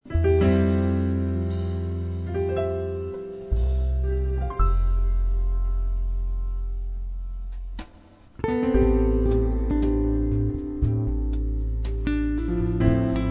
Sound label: Sweet Piano, New Age; New Age Collection Vol.2